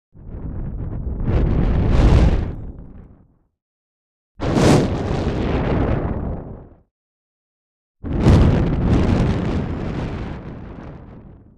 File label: Flame in the wind; fire